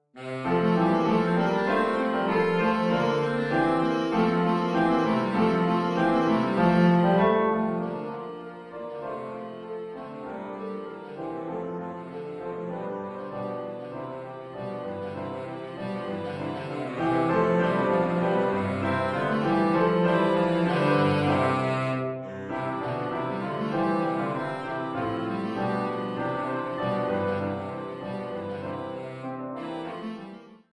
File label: Cello Sonata Allegro; Marcello; Opus 2 1; Saxophone Solo